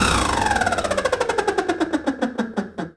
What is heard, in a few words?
broken ventilation fan spin down